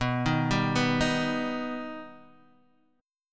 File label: B Augmented B Guitar Half Step Down